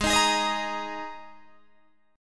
G sharp Suspended 2nd, G sharp, down, Guitar, Open D